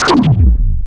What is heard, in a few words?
laser